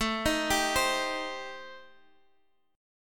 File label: A Minor 7th Flat 5th A, Guitar, DADGAD